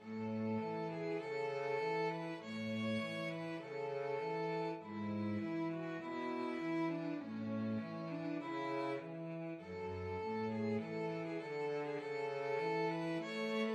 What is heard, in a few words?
un poco adagio violin cello duet; clementi